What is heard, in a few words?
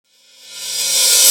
Rev Cym; RadioactiveSandwich - Homunculus